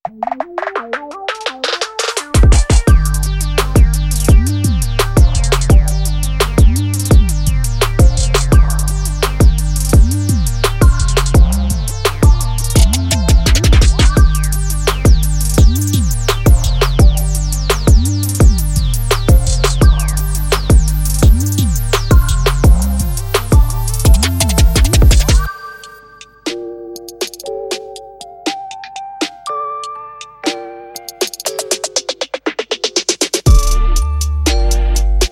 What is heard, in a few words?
Trap; apollo sound; fill the trap 2